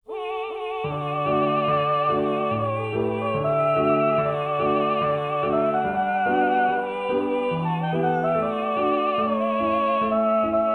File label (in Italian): Duetto; Scrivo in te